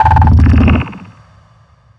ping dry, strider, combat; creatures

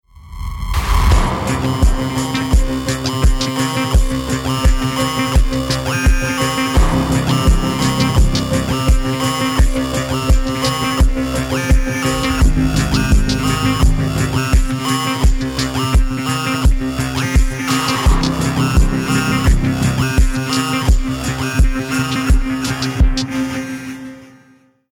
start action, music

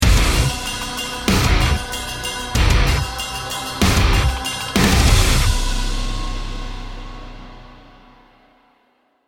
won round, music

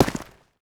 dirt, footsteps

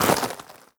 step light, footsteps player debris

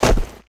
land snow, footsteps; player

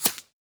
bullet by supersonic tail; weapons; nearmiss; Counter Strike Model Pack; CS2

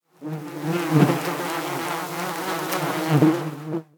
bees; ambient; inferno

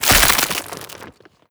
zombie move loud, foley; Half Life Model Pack

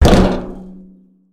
land metal barrel, footsteps; player

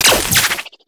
orb bolt

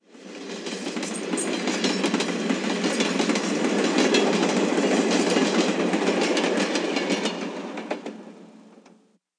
train pass; ambient; machines

